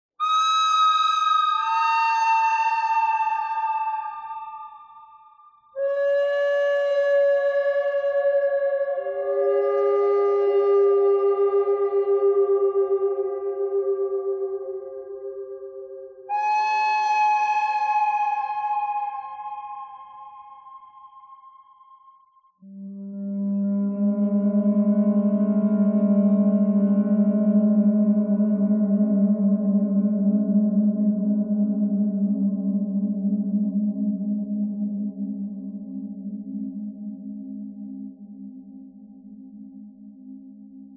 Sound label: bird thread pentatonic; noreverb